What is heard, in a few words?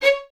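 Violins C#5 staccato left; Miroslav Vitous; String Ensembles; 11 Violins; 11 VS Stacc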